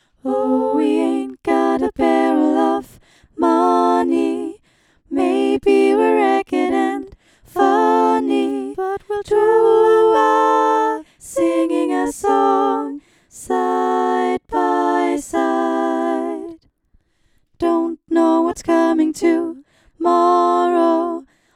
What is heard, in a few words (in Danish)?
Alle Stemmer